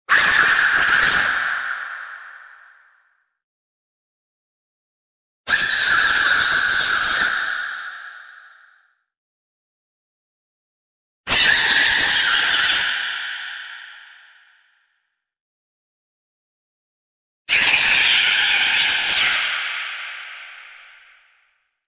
Dragon baby screech; Fire Breathing Dragons Wizards Fantasy

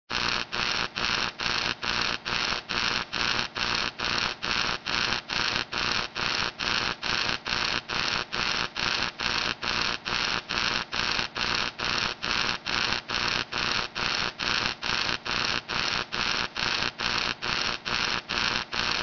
Fast neon arrow sound

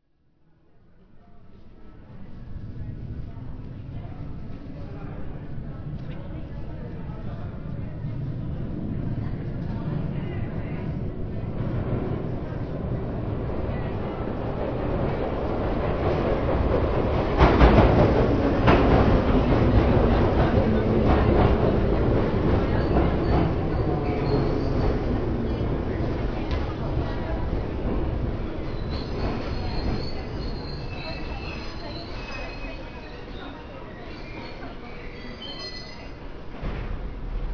London Tube stops; Trains Railway; Train Signals Rolling Stock

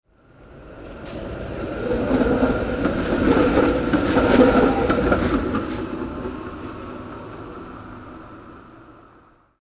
Street car pass; Trains Railway; Train Signals Rolling Stock

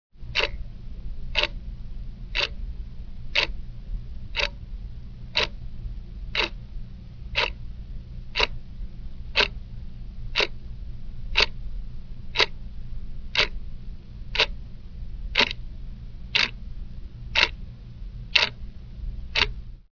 Ticking clock; alarm clock

